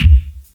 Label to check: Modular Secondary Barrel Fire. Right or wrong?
Wrong